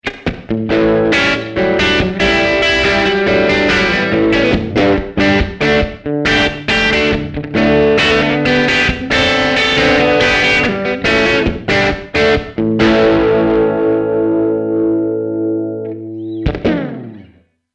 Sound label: Vintage Crunch